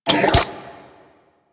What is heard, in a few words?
Sidearm Charge; Weapons; Warframe; Tenno; Wraith